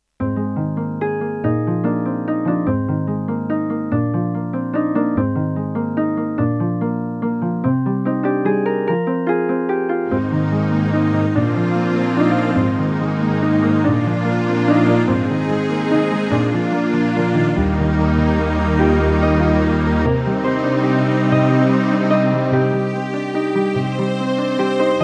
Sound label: Irish, Terratec WaveSystem SCW001-2; GM MIDI